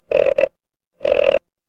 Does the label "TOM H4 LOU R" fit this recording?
No